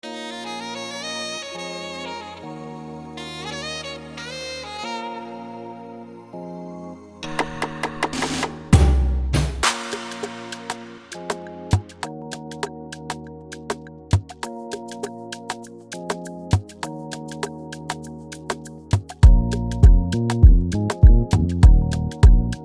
Freebeat Afrobeat; Prod By Tkeyz Beatz

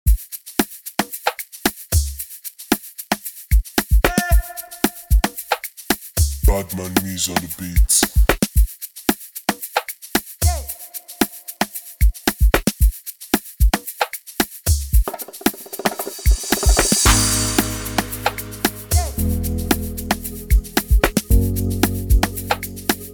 instrumentals

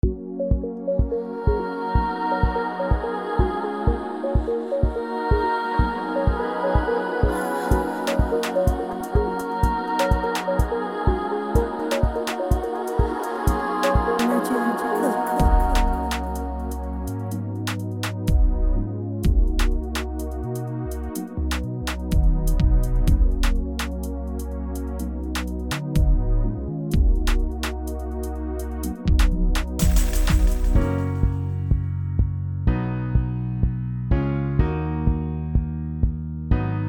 Freebeat Heart Trap; Prod By Legend De Beat Cook

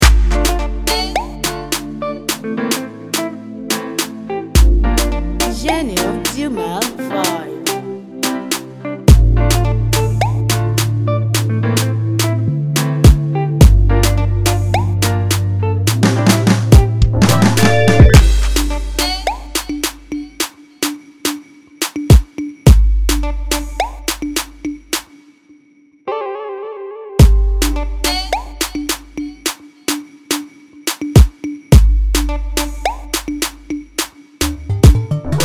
Afrobeat Freebeat; Prod By Fiveooh

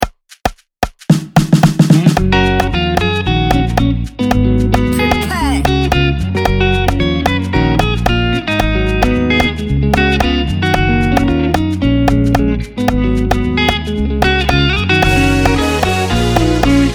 Freebeat Palm Wine Groove; Prod By Prepare

